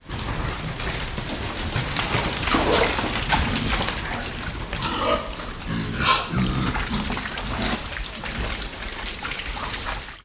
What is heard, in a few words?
animals pigs run around playful in wet mud shed